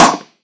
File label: Fracture Snare, Found Percussion Hits; UNUSUAL KITS and FX; ModeAudio